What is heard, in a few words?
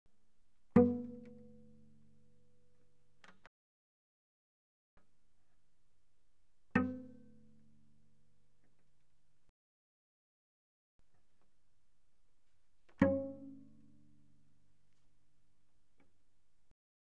Cello pizz mf sul C C4 D4; Strings